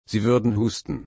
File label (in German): husten